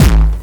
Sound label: distorted crispy analog techno kick; one shots; kick drum samples